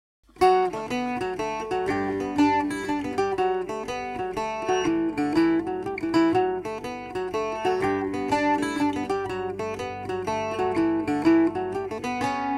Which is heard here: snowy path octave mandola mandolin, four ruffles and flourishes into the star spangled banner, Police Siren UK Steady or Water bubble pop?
snowy path octave mandola mandolin